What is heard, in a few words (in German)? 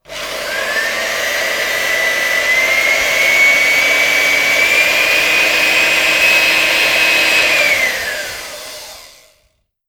Handrührgerät; geraeuschebingo